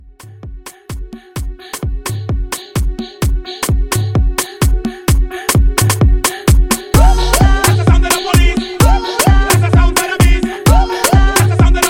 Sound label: Club Mixshow Hype; Club Mixshow Hype Edit